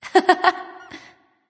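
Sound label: "heh heh", nonverbal; smite; voicepack; khepri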